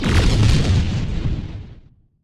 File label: EXPLO; Best Service; Advanced Mega Effects